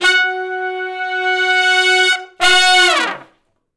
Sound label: Long Note Swell & Falloff (Gb) unison, Horncraft; Sections; Crimson Blue and Fabulous Horncraft 4 RnB; Horncraft for R&B